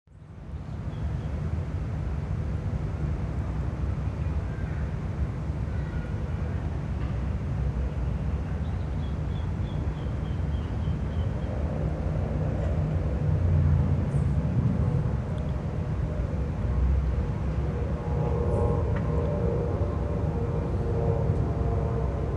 avion oiseaux

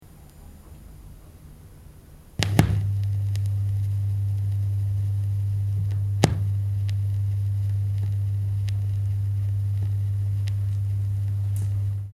rumble phono

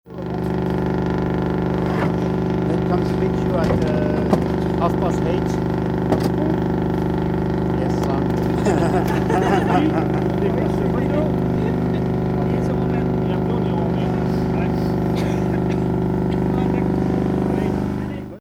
bourdon chalutier Lesconil

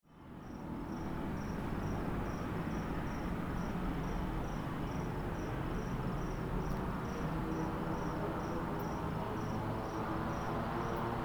nefta terrasse hotel soir; resonances oasiennes

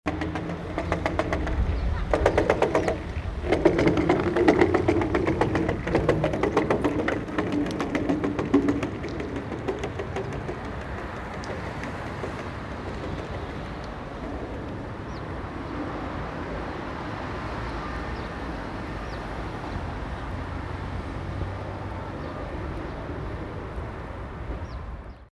sol urbain valise jetee

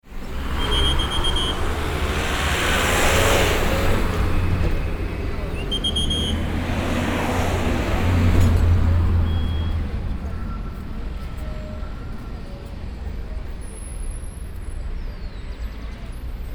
dilatation bogota

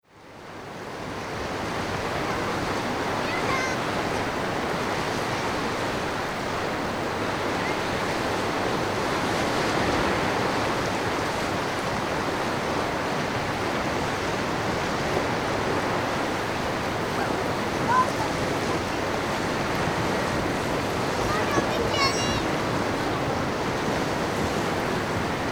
bruit blanc; lesconil

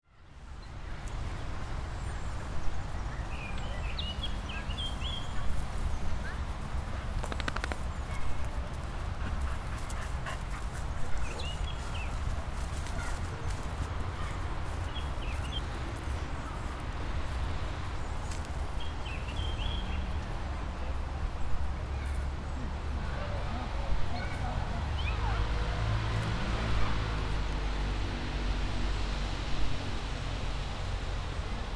nature bord route enfants vent

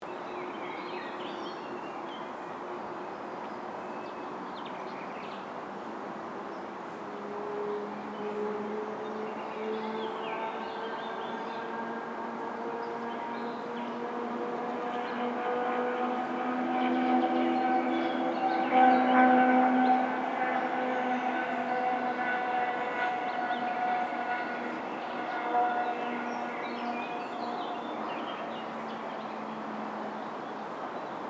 nature bord route route loin

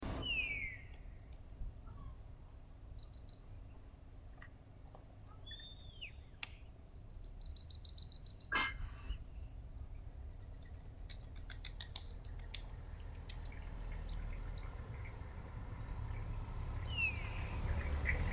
oiseaux; lesconil

